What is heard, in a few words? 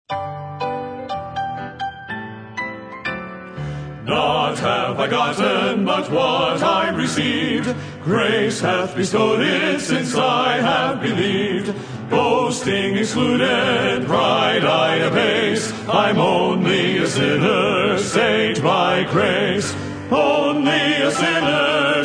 Men's Quartet